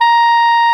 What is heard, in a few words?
SOP SAX A#5; Greytsounds VOL-1